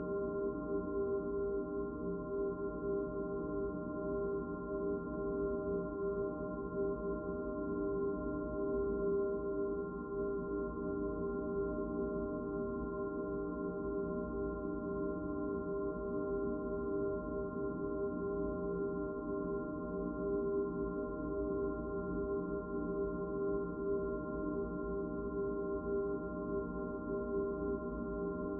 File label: Drone complex chord with higher freqs left